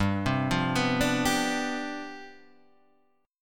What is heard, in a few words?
C Major 7th Suspended 2nd Suspended 4th, G, chord